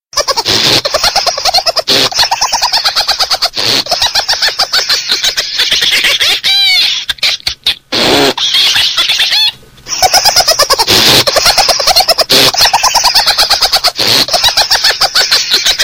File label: the laughing cat